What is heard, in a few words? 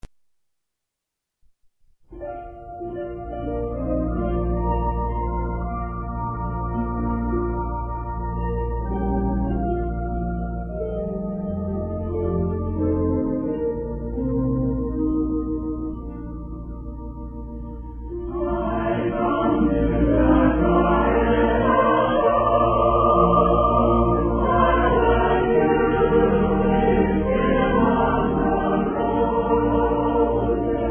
Youth Choir